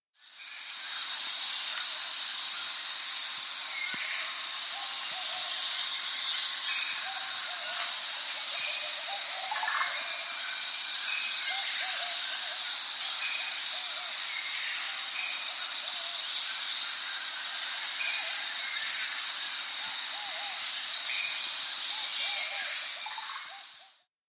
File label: Yellow-Rumped Antwren; S. America